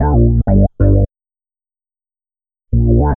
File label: SYNT, Roots Funk; USB Soundscan vol.41; AKAI